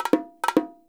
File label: PERCUSSION LOOPS; Sampleheads; NYC Percussionworks; 90 s Sample CDs